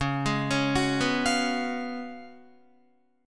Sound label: Db 11th Db, Guitar; arpeggiated; JGuitar